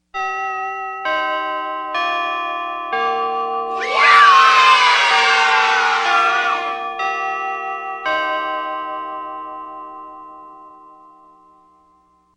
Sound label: death; springtrap